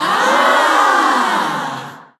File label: Ahhhh; Running Man Jungle; genki